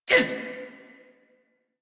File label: Pain, en; Warframe; Corpus Aristocrat Manager; Treasurer; Battle Barks